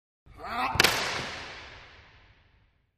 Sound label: Racquetball; Body Runs Into Wall With Vocal Effect